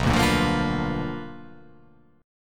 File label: B Minor Major 7th Flat 5th, chord